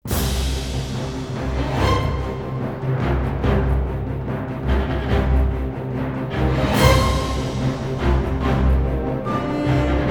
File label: No Choir, Orchestra; трейлерная музыка